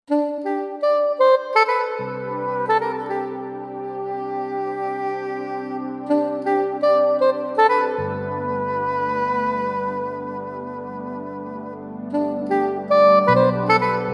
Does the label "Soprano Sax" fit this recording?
Yes